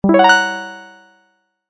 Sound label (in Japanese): 不気味・不安な音 ベル